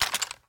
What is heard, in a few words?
weapon remove; weaponweights content